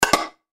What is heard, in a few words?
Button Switch; Multimedia Web Interactive; Buttons (Sound Effects:Multimedia; Blastwave; Technology